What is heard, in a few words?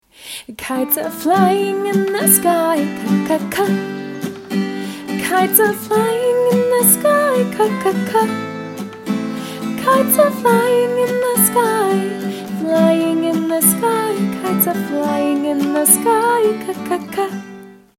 K song